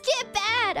Scream Skip ad; IRyScream

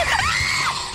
Scream; IRyScream

